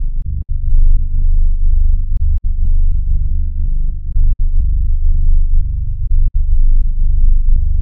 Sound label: tech house bass samples dm 123; instruments; loops